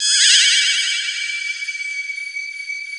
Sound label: MARTIAN, Synth FX